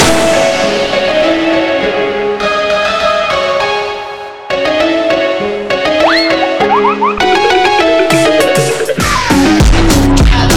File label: Phonk